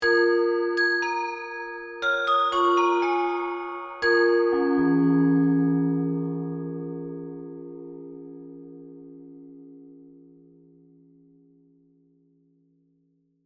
Musical Chinese, Production, Element, Musical, Chinese, Oriental, Bells, Accent, Mysterious; Musical Sound Design Sound Effects; BlastWave